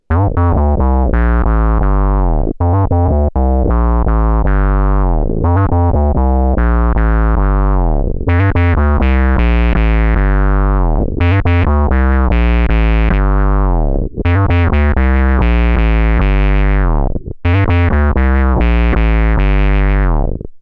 octavius squeezer, bass

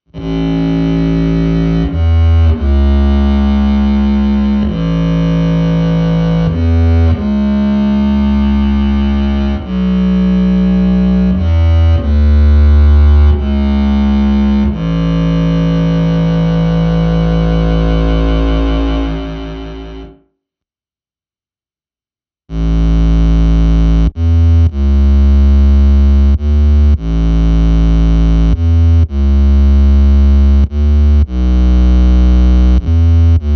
Future Impact synth cello into full wet reverb; bass

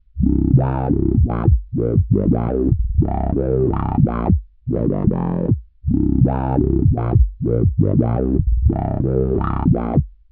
squeezer vs korg g5, bass

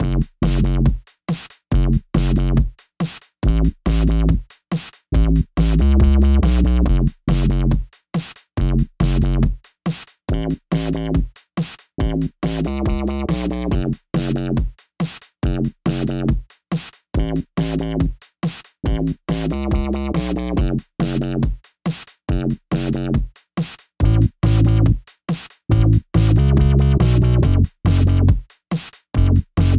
Future Impact Square, bass

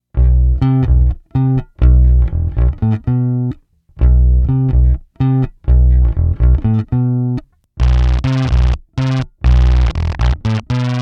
manta as drive box voices, bass

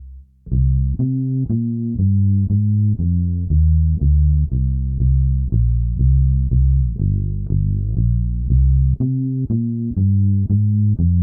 fretless simulation, bass

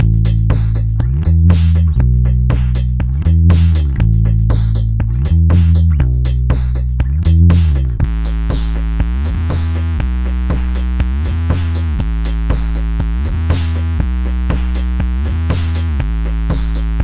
octavius squeezer super squared fuzz, bass

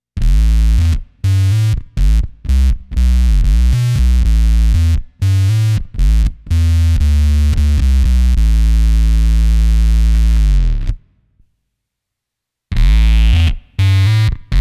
mild vocal synth; bass

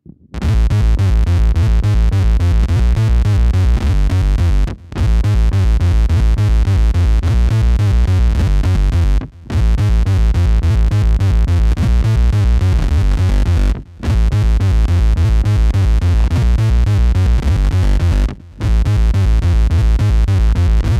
boss GT10B arpeggio, bass; Arpegiatted Synth via Wave PDL; Lakland Duck Dunn P bass picked; GT-10B USB

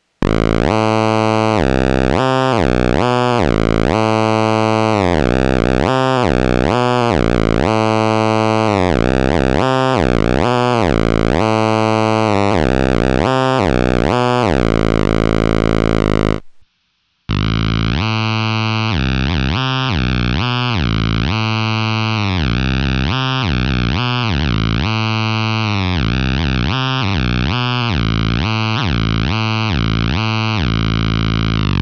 octavius squeezer square, bass

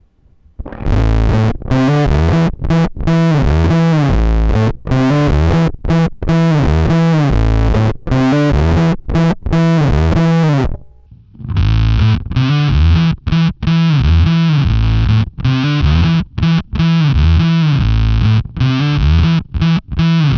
octavius squeezer fuzz, bass

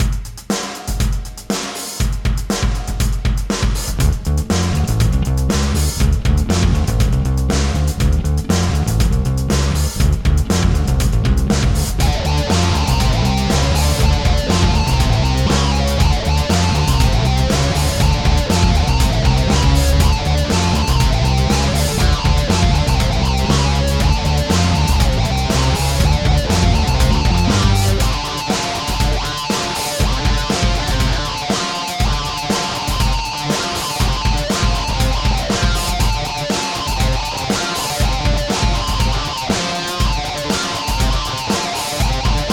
boss GT-10B bass guitar simutaneously; Live bass AND guitar via effects; Lakland Duck Dunn P bass picked; GT-10B USB; Live ad-hoc jam showcasing the BOSS GT-10B dual-channel

